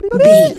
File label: voice fun character flutter man